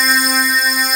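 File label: synth C5; Best Service Gigapack 1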